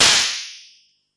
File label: FM Cymbal, harsh; Harsh FM Cymbals